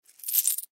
Coins Switch Hand Multiple; Money Sound Effects; Objects; Foley

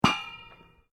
Sport Ball Rubber Bounce Metal Grill, Rubber ball; Bounces; Objects

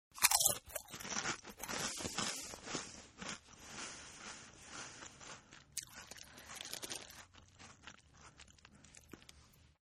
Crunch n Chew, Eating; Human; Partners In Rhyme

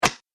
Face Punch; Punch And Hit Sound Effects; combat

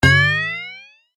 Cartoon Boing Effect; Boing Sound Effects

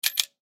Coin Dispenser; Coin Dispenser Penny; Money (Sound Effects:Objects); Blastwave; Foley

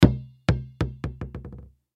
Basketball Drop; Basketball Drop Bounce Wood Surface Low Bass; Sports; Blastwave

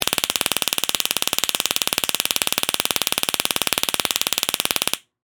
Police Taser Gun, Emergency, Activate; Gun Shot Sound Effects; SoundEffects-BlastWave

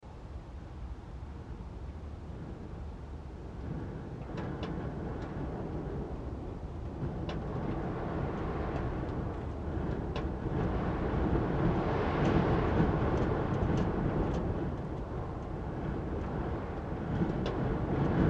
Wind Attic Space Gusts Med; Interior Attic Space; Rattles; Weather

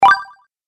Magical Token, Magic Sound Effects

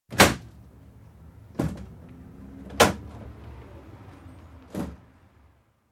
Ambulance rear doors opened (interior acoustic); Emergency Vehicle Sound Effects; BBC

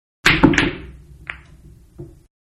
Billiards Break no pockets; Pool; Sports; Manhattan Production Music

